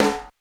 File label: Statement, Beyond Snares; Airwindows